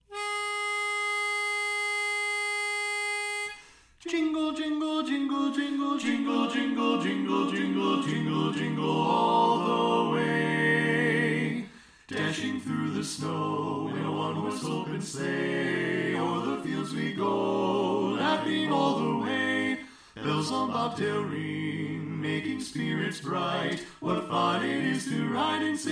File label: Jingle Bells Lead Left; Christmas show